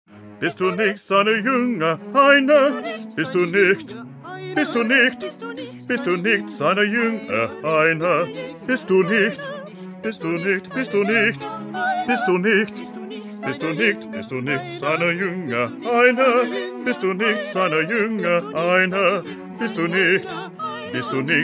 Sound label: Bass; Bach; St John Passion